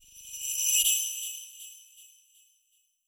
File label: sleigh bells reverse echo